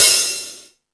Jazz Drums, E-MU Pro-Cussion; E-MU Pro-Cussion-1

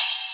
Cymbals, Elektron MachineDrum SPS1 MKII

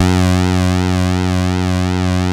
TEISCO, BASSES

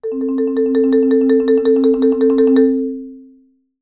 Marimba roll F3; Sampleheads - Dave Samuels Marimba & Vibes

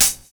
PAISTE HI; Univers Sons

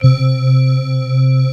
TS 10 GLAS, SYNBELL

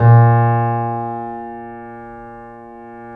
YC7 piano, pp; Roland L-CD701; pp YC7 St

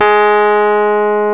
PNO, Rhodes Mk.V; Keyboards of The 60's and 70's

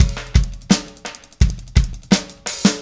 Static Beat Fill; Sonic Foundry (Sony Creative Software); Indie Rock Anthems; Session 13 - Static

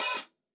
OPEN HH, HI HATS; Basement drums vol 2

